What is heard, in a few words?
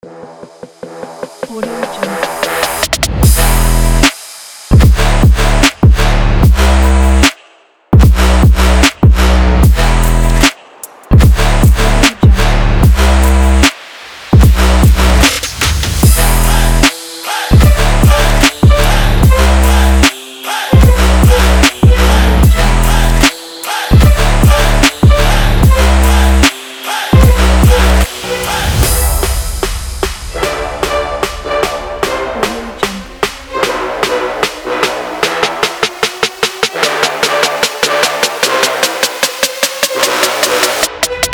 sport hip hop trap; sounds-fresh